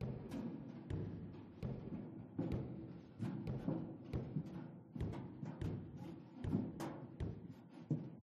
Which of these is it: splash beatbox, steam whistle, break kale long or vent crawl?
vent crawl